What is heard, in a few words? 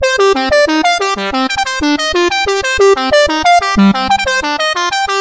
Classic Synth